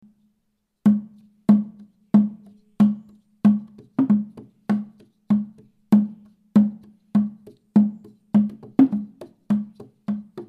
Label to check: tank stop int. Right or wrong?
Wrong